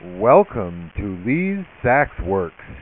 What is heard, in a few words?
sax welco